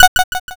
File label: toggle off